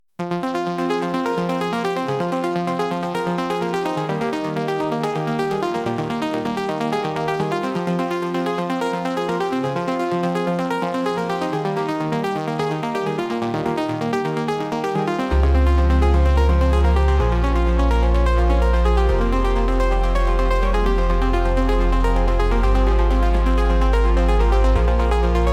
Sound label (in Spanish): fondo